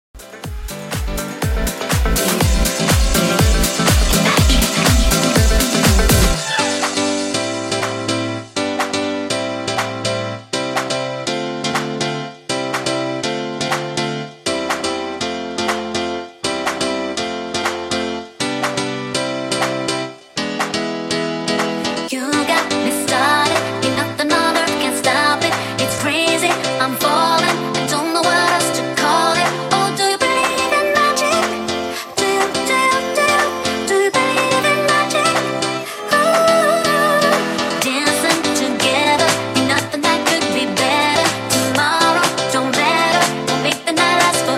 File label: Extended Mix 122Bpm